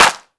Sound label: smooth clap one shot d key; single hits one shots